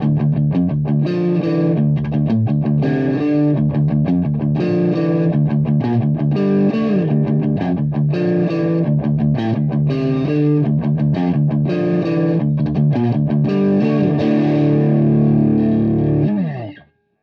Verzerrer Pedale Mid Gain; Walrus Audio Ages

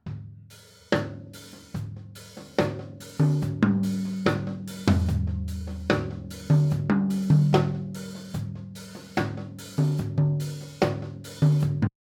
Toms Roh

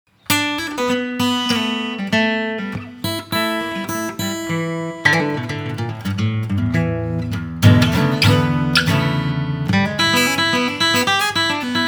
XS Saiten Mikro bluesy; DAddario

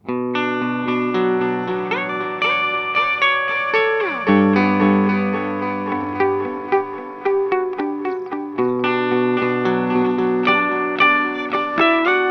Earthquaker Devices Disaster Transport max delay time mix 15 Uhr repeats 13 Uhr

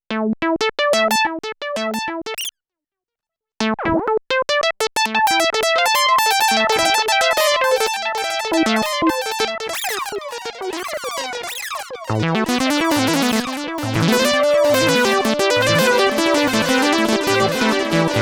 Tapedelay

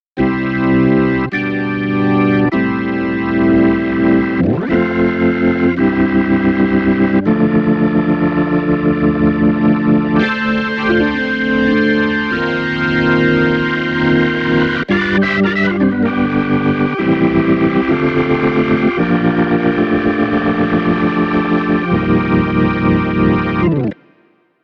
Yamaha Orgel

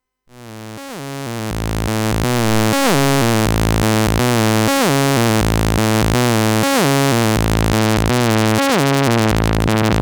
saw mit wobble; microacid8

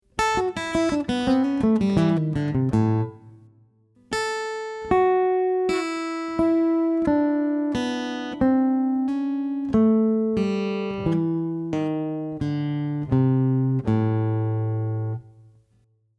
Lick 1 Akk

